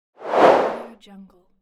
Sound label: whoosh; dynamic sound